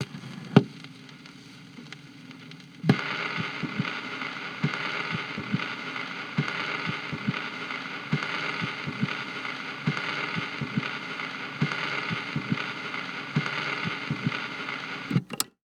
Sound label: Electronic Machine Wood Record Player Record Ending; AdobeCC